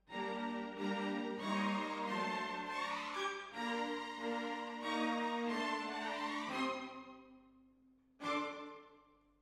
Hall

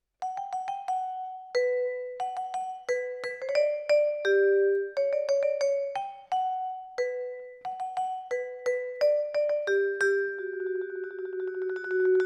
Vibra Close

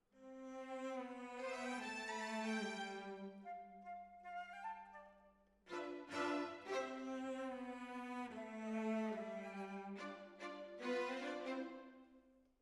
Decca, Jazz for piano

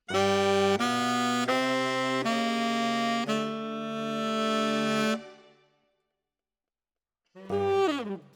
Tenor, RNR for piano

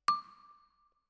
Vibra Close

